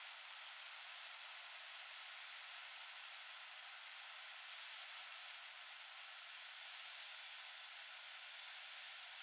ambient; World of Tanks

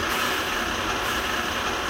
chasis loop

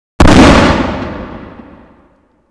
Inf Weapons; Red Orchestra 41-45

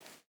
footstep barefoot dirt jump start; Rust

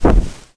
gas jet, tank breakdown; World of Tanks Blitz

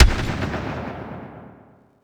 imperial carbine 1shot far

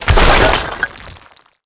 fence stone wood; World of Tanks Blitz

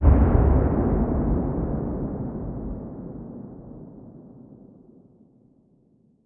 impacts; World of Tanks

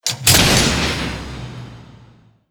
unlock; Call of Duty Modern Warfare

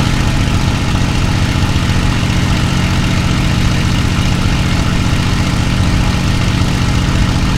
mustang cockpit, engines; War Thunder

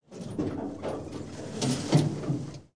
rocket reload

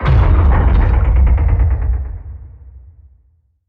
submarines engines; World Of Warships

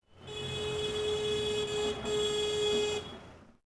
Horn Doppler; Ambient; Civ4 warlords

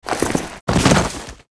dive over wall; Call of Duty Modern Warfare

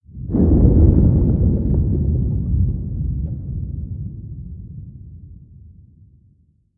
earthquake rumble; Call of Duty Modern Warfare

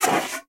extinguisher false, tanks

